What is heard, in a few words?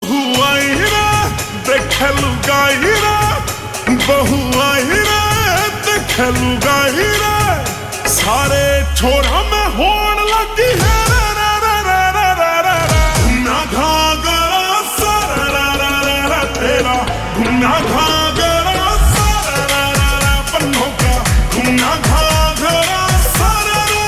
Ringtone Slowed Reverb